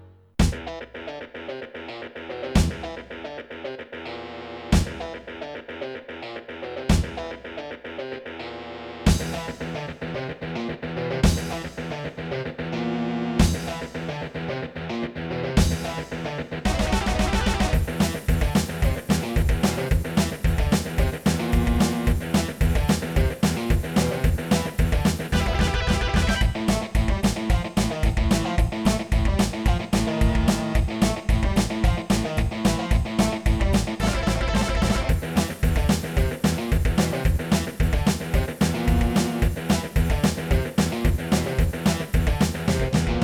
AW744L

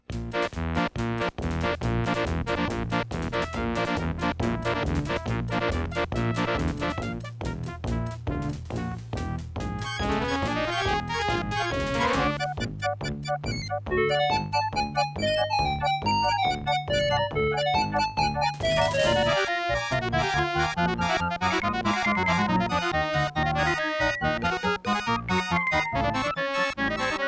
Aztec 2316A; Day of the Tentacle Opening

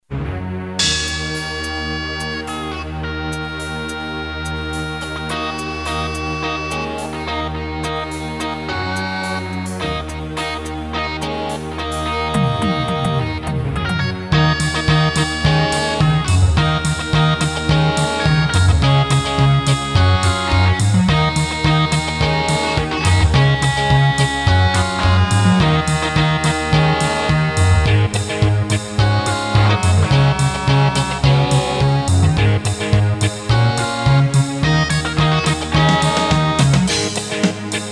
Casio GZ-50M; Battle Isle 2

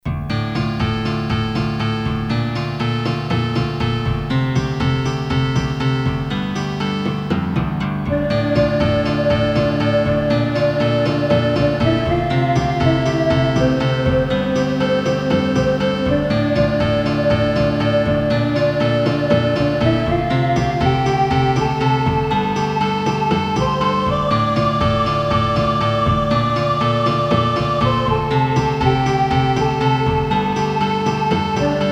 Casio GZ-50M; Ultima VI Intro

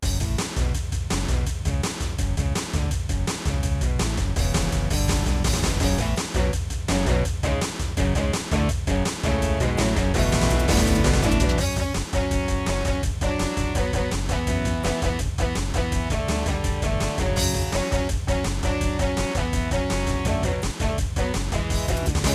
AW744L; Duke Nukem 3D Intro

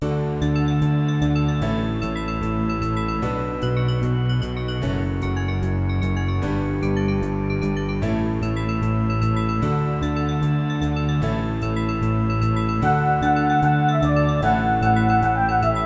AW744L; Lemmings Intro

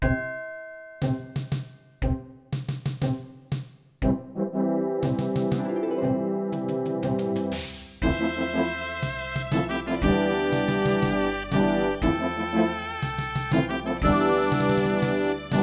AW744L; Runescape 08

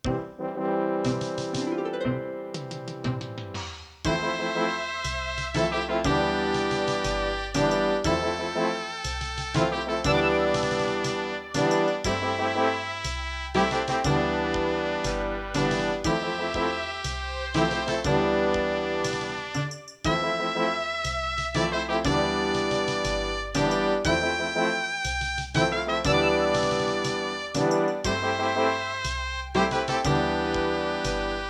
AWE32; Runescape 08

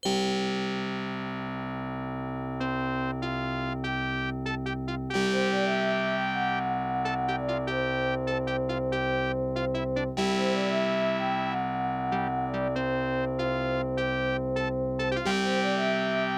ESS ES688; Space Quest 3 Introduction

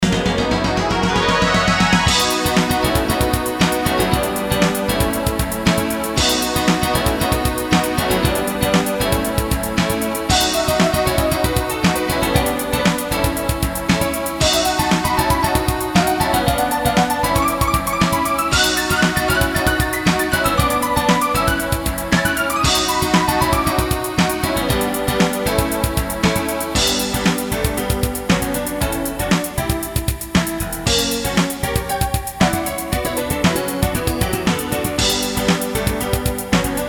Casio GZ-50M; Legend of Kyrandia Dragonflight

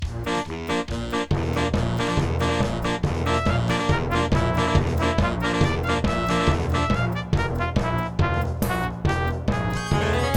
Pinnacle; Day of the Tentacle Opening

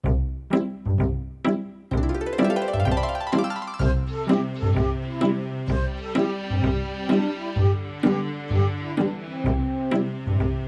AWE64 Legacy; Settlers Intro

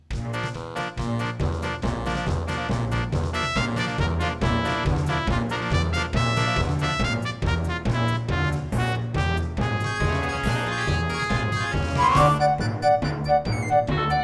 AW744L; Day of the Tentacle Opening

